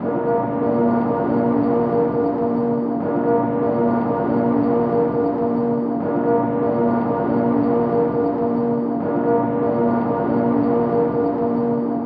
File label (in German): Glocken Dom